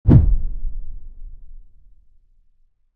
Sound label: bat swing; 攻撃